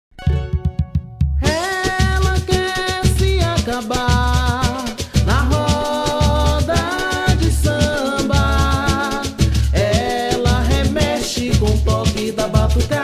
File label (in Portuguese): samba